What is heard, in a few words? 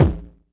Tom Low